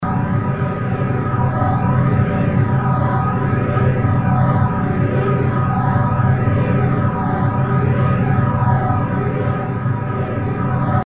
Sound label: Mech Drone